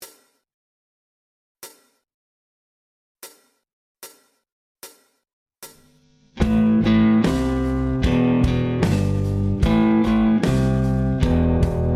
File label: Left Hand Rock, gitarren